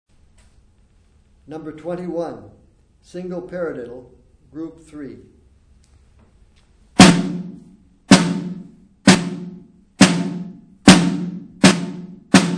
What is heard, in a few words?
snare rudiment